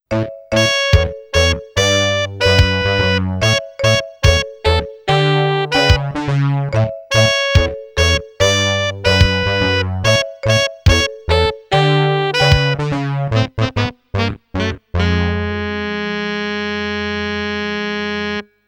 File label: Game Over; STH1; Masas Demo Version